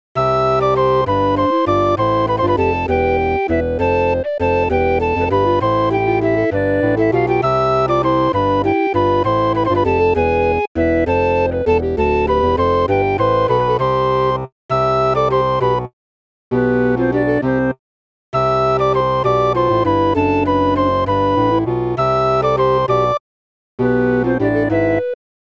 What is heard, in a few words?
tavern; daggerwalk